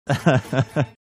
short chuckle

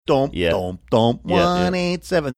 singing domp domp domp

